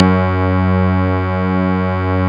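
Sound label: synth, MicroWave; Sound & Vision - Gigapack I CD 2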